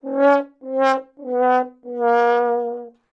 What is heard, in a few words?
Sad Trombone, notifications; Google Pixel 4; Reality Bytes